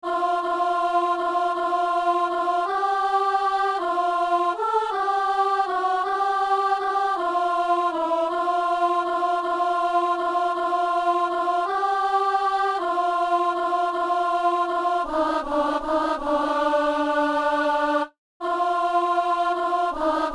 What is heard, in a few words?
alto; Venez, divin Messie; Messe du 17 janvier à Saint-Denis